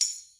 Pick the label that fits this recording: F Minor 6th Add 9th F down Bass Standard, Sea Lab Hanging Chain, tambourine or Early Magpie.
tambourine